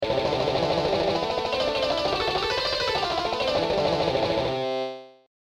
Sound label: Sweeping solo; Sweep Excercises